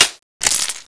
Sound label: kingcobra clip out